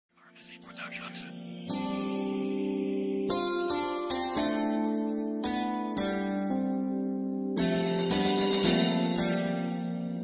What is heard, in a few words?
smooth and sexy rnb beat